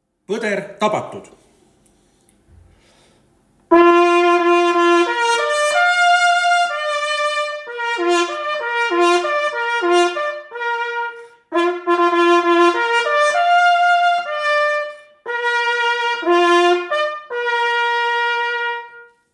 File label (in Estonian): poder tabatud, Jahisarve võistluse signaalid